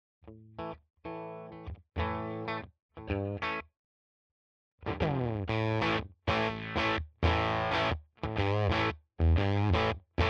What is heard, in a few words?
Riff D low gain; Octa Hive white